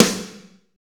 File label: SNR, Drumscapes Roland; Northstar - Drumscapes Roland